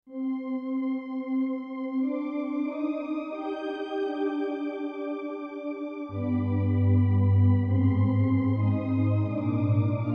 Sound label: Classic Ana Pad